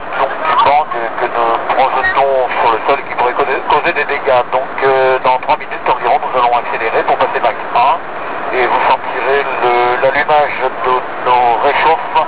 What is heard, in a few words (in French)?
rechauffe